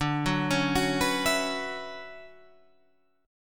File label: D Major 13th D down Guitar Standard; arpeggiated; JGuitar